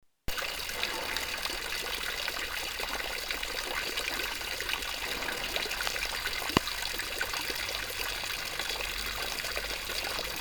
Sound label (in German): Brunnen Linzer Landhaushof